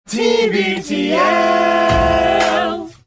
jingle mashup; tbtl

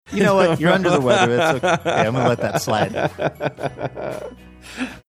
laughing youre under the weather

